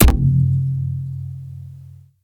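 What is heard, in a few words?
lantern, impact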